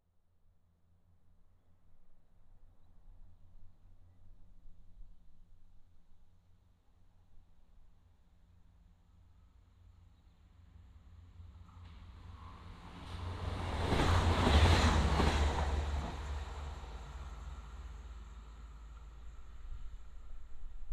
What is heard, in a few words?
Trains